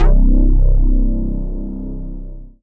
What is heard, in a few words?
Dance Floor Bass, Multisample Basses